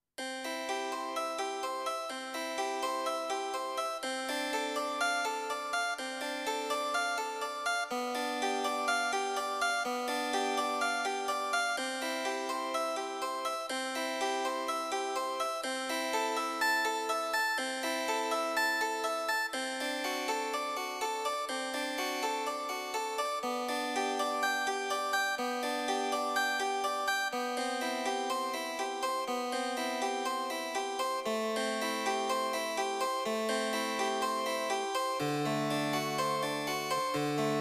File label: Harpsichord